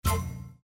Windows Critical Stop

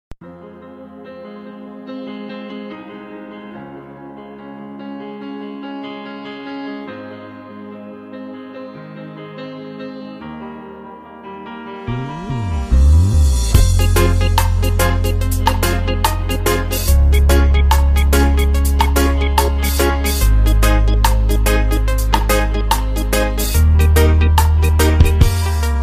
Reggae Remix

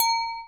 new mail

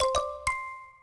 logon

